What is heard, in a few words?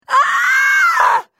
scream